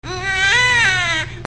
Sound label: Baby Crying, people